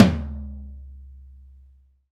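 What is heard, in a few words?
tom low, Roland; Club-50 - Foundations Roland; Ext.Snare 1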